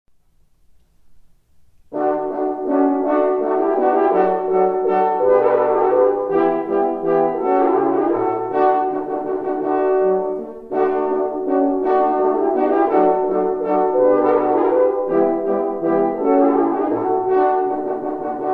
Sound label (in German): Menuett